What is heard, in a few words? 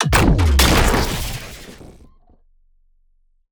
Sci-Fi Energy Explosion